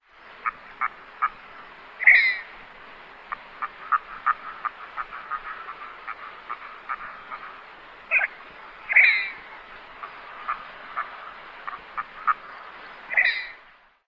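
female quacks and male calls, Red-breasted Merganser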